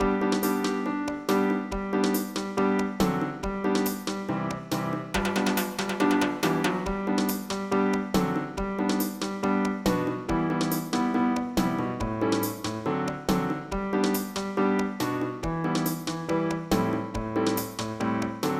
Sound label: rhumba; Massive MIDI Collection